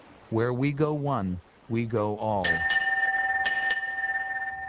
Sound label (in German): Schiffsglocke; Eye of the Wind